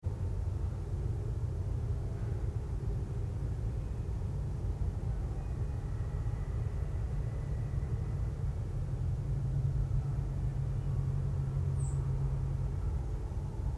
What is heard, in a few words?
artillery battery